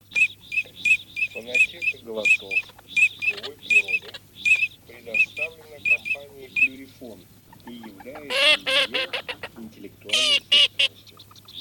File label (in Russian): Чирок-свистунок Alzavola